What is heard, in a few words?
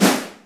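Snare Ambience, Boss DR660; drum machine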